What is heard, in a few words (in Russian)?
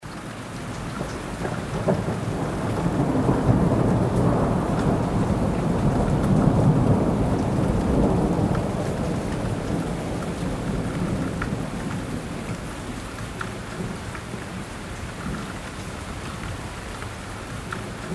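дождь с небольшим ветром; Звук ветра и дождя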